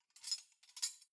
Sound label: cutlery knives handle soft